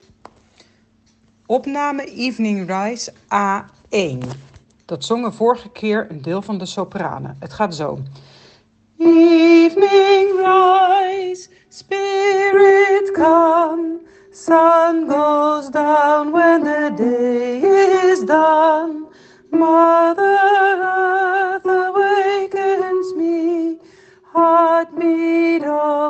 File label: evening rise